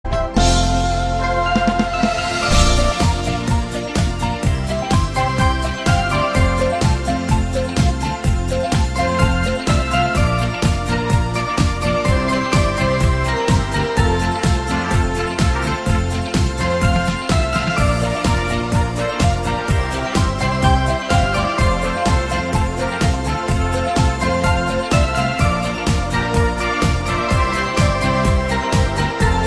instrumental

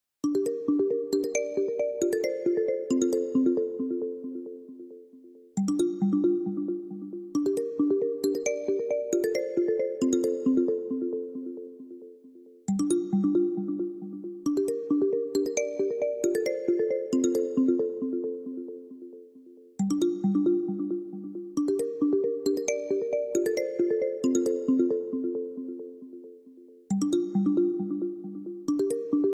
Soft Professional Ringtones

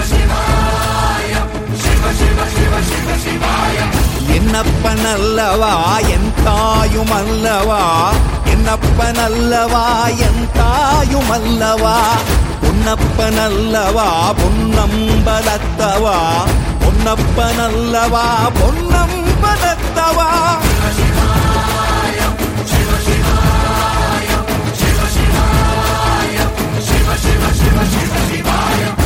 tamil sivan ringtone

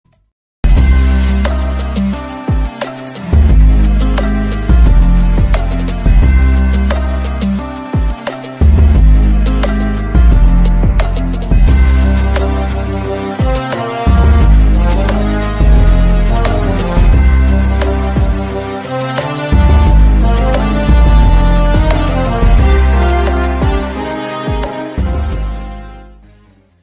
flute; mandalorian

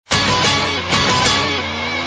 Da Da Da Notification sound; Espn Ringtone

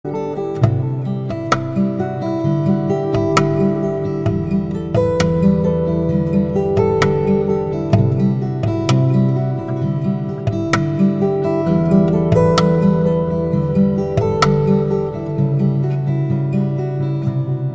iPhone SE Ringtone

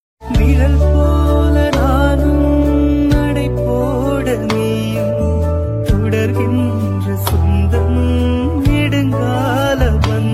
Tamil Wedding Anniversary Songs Ringtone

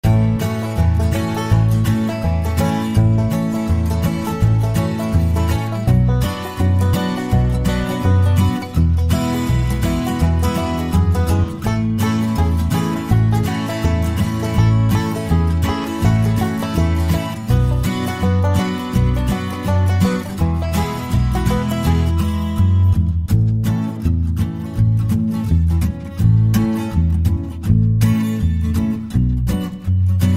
ringtone instrumental

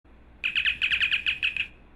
Communicator Ringtone; Star Trek

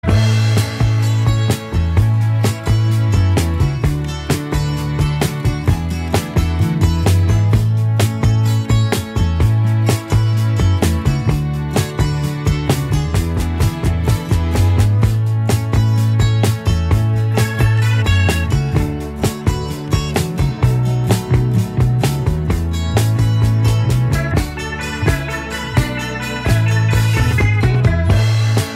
Instrumental Ringtone

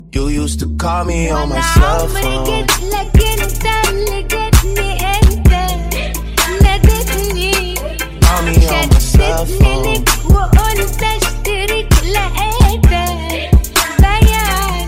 ringtone arabic

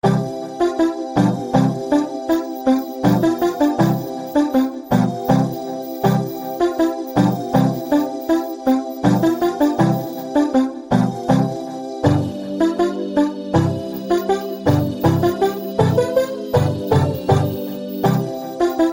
funny christmas ringtones

